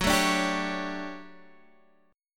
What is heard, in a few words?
D Major 11th Gb, chord